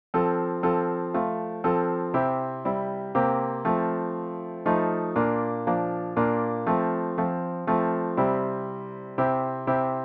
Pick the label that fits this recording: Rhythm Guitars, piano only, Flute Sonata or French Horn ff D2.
piano only